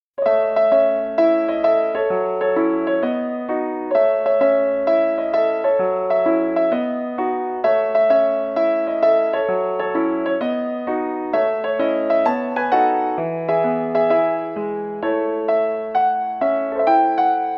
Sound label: Piano Arrange Version